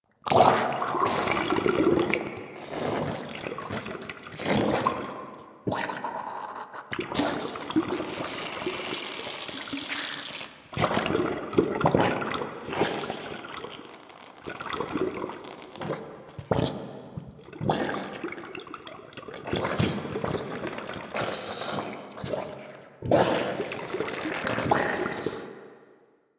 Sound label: Goo Pond Spash Loop; Ambience; Props; Warframe; Infested Eris